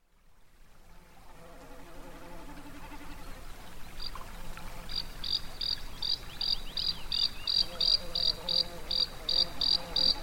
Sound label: insects and tundra habitat; soundscapes